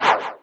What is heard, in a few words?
throw